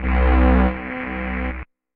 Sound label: Deep System Bass